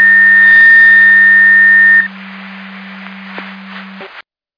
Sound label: Siren; Hamburg FD